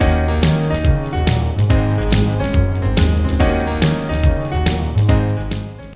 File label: dance